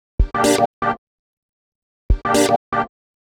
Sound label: 126 G MUSIC; Loopmasters; Funky Underground Vol 2